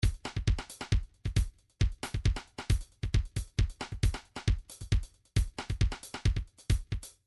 trance midi loops beats jumper; Newtronic Trance Constuction Kit

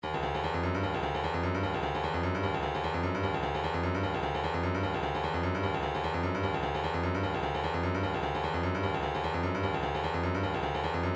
tambourine, midi

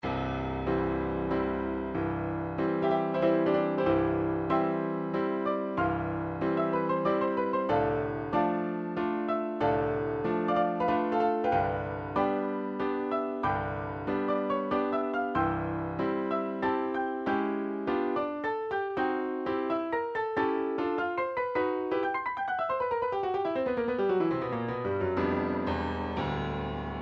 piano concerto, midi; tchaikovsky

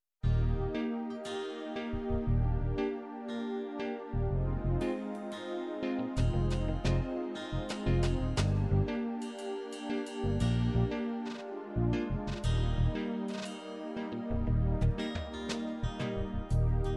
french chanson

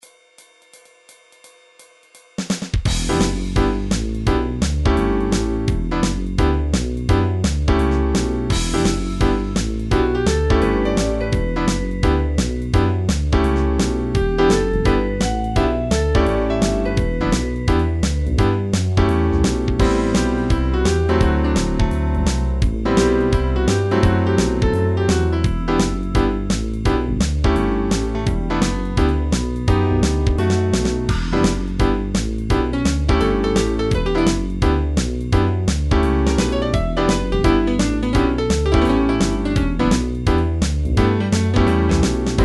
jazz bluesy, midi

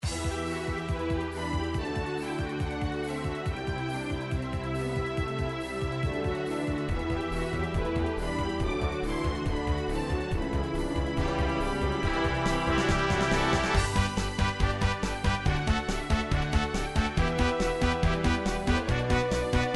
upbeat remix; sequenced; nintendo; final fantasy; underwater temple; pongball

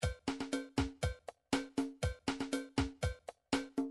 midi drums rhumba

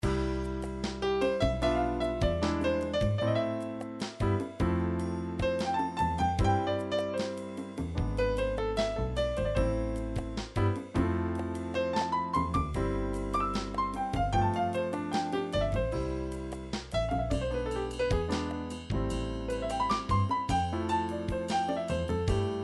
Jazz, midi

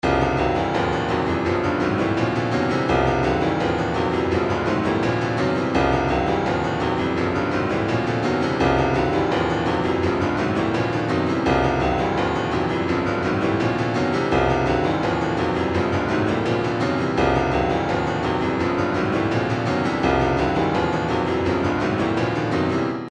retro funk 084, midi; south central

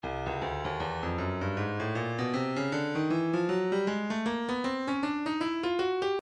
breakbeat midi files 075 079 bpm; Slithery C; Stylus Breakbeat Midi Files

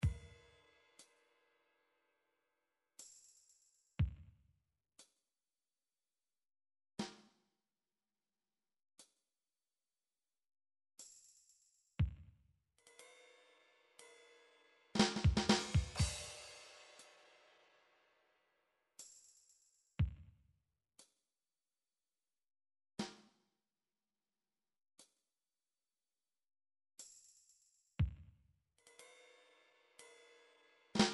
drum midi 8beat; Drum Midi Files Collection

